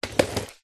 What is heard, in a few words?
rollerblade single roll step; foley